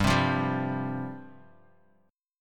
G 7th Suspended 4th G down Guitar Half Step Down

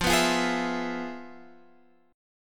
F Minor Major 7th Flat 5th

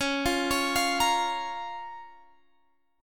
Db 6th; arpeggiated

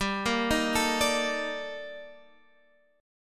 D sharp Major Sharp 11th, G

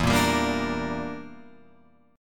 E Minor 7th Flat 5th, Guitar, Open F; Em7b5 strummed

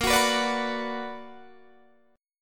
B Minor Bb; Bm/Bb; strummed

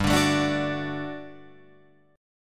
G Major 7th Suspended 2nd G down Guitar Open F; GM7sus2 strummed

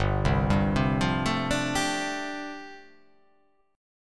G 7th Suspended 2nd Suspended 4th

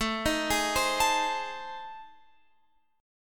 A Minor Major 7th Flat 5th, Guitar Open F; AmM7b5; arpeggiated; x,16,15,15,15,16

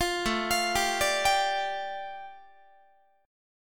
A 7th Suspended 4th Sharp 5th A, Guitar; A7sus4#5 arpeggiated

